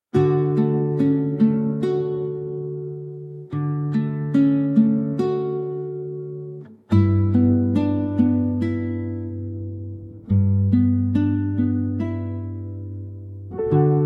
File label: Sea Side Melody; BGM; WhaleAdventure VR